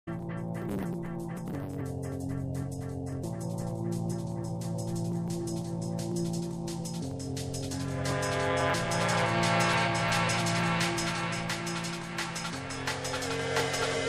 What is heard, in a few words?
Drum and Bass Jungle and Old Skool, UK Bass Radio